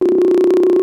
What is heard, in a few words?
Beepy Glitch